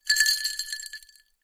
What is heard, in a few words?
ice glass, glass clinks